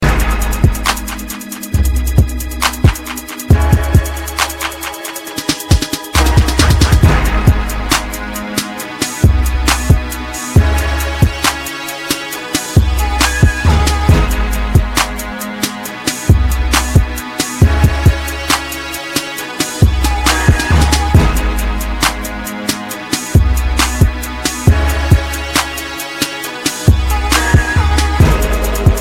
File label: Real Hip Hop